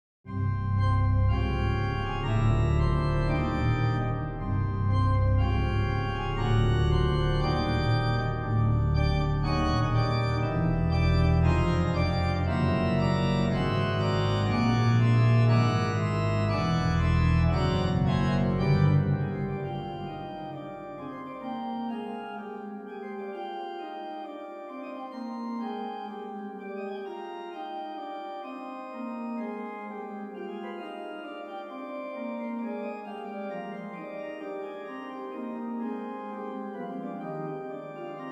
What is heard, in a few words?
Maestoso, Organ Recordings; Expanded Schantz; Schroeder, Hermann; Praamblen und Interludien: 1 Maestoso